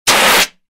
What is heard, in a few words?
Fire Extinguisher